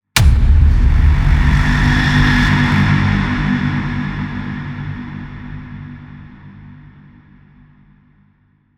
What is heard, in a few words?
Tension Builder Impact